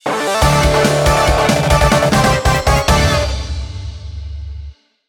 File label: Music event; Power Pro Kun Pocket R